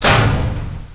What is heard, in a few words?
menu command; Hexen II